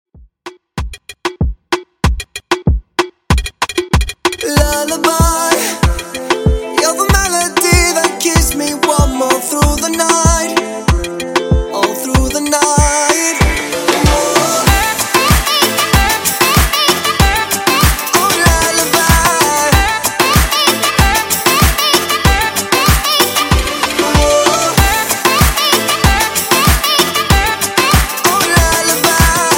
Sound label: Mixshow 95Bpm; Redrum